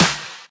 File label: steel snare drum sample f key; snare-drum-samples